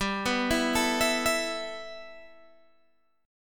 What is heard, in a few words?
G 6th Add 9th G Guitar Open G, chord